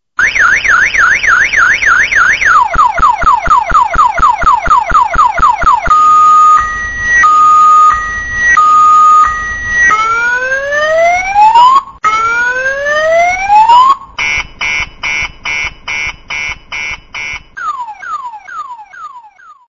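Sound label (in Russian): zvuk signalizacii avto, car alarm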